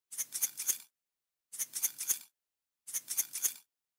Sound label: Salt Shaker